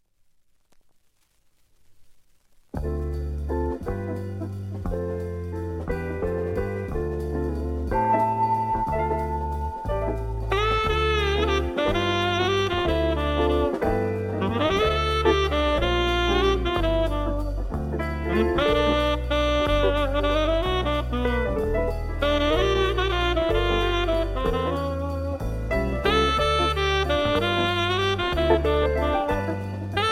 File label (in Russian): instrumentalnyiy ansambl